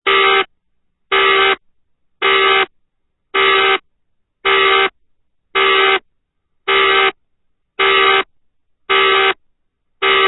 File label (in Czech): signal